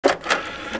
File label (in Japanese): 開く音